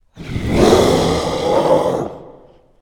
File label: Victory Monster Vox Roar; Smite; Susano